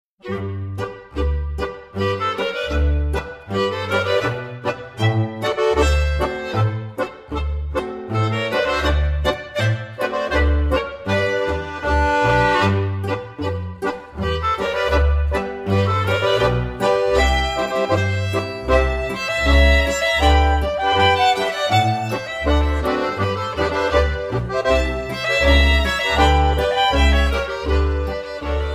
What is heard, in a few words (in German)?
Geigenmusik